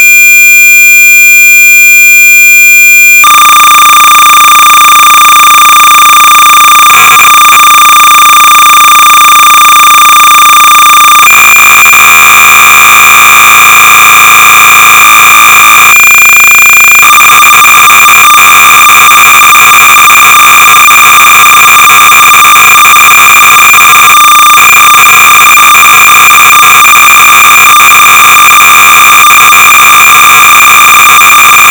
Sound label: boot sector Sorcerer 300baud